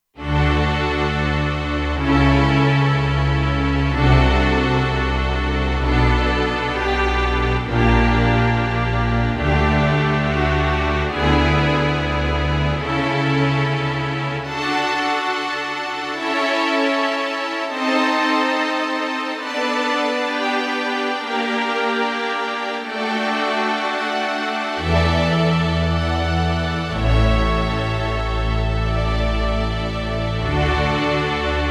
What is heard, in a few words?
Silk Strings, Kurzweil K2500X; Factory Programs